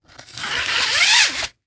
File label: Weapon Machete Place In Sheath; AdobeCC